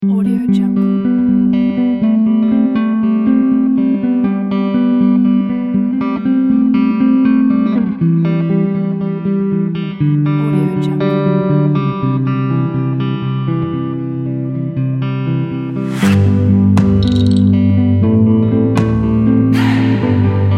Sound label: commercial indie folk